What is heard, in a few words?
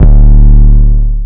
808 aggro D, Tonal; Hip-Hop; Slate; CAICOS Hip-Hop Sample Pack